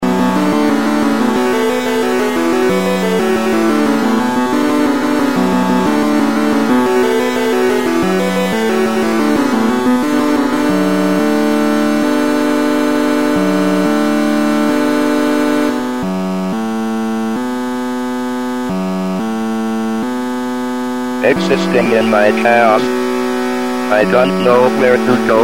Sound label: long noisy robo voice version; endererror romantikzerstoern